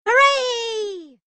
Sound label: Hooray